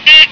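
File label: DIGITAL BEEP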